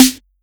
909 Snare

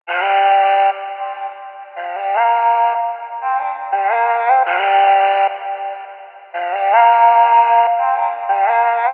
vocal chop, 105, Gm; Insane; Slate Digital Omnivox Vocal Sample Pack; Chops

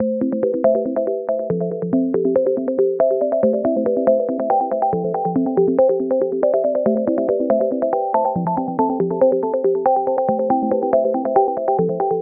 songstarter 140 Am, Pop; Slate; SD PASADENA Pop Sample Pack

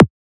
kick one shot, drums; Pop; SD PASADENA Pop Sample Pack; Slate

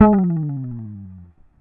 Bass Slide Down High, Electric Bass One Shots; Slate Digital BASSHOLE Sample Pack

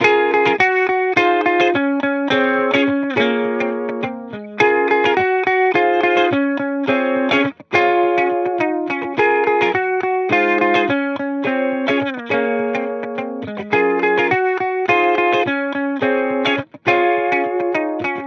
guitar loop 105 Ab; Pop; Guitar Stacks; SD PASADENA Pop Sample Pack; Slate

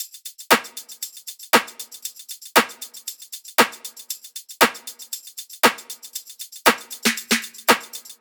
top loop, Drums, Loops, Top Loops, Pop; juniper 117; Slate; SD PASADENA Pop Sample Pack

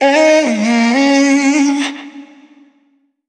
phrase Ebm, Vocal

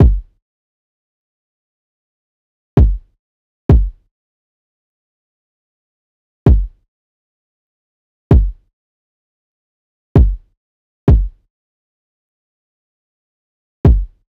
kick 130, Kick Loops; drum sample pack; Bagana; SEISMIC; Slate

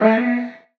vocal tap, Bm; SD AURORA Sample Pack; Slate

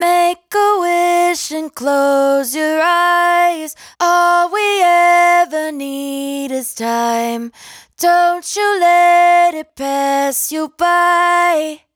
hook Make A Wish 120 C#, Dry Vocals; Raw Vocals; Slate Digital Omnivox Vocal Sample Pack

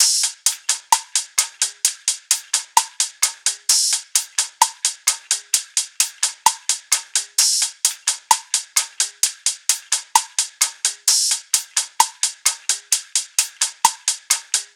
perc 130; Drum Sample Pack; Loops; SD SEISMIC; Slate

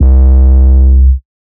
808 Bass Fuzzy C3; Slate 808 Deep Dive; SD Signature 808s

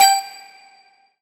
tonal oneshot G; Pop; Slate; PASADENA Pop Sample Pack; Tonal One Shots

